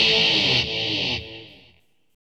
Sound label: GUITAR, ROCK POP; MAGIX